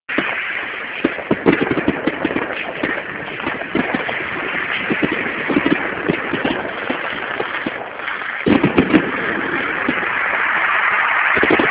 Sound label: fireworks